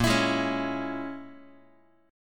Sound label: A sharp Minor 7th Flat 5th A sharp, Guitar 4A,4E,5A,5D,5G sharp,6C sharp; strummed